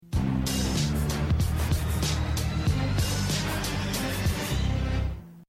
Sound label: Text Tone; Gta Iv